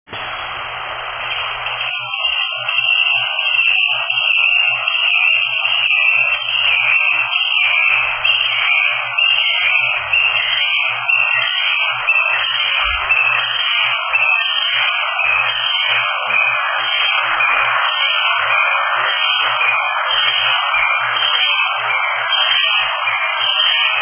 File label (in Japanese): コジュケイ; 遠藤